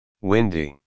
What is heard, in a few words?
windy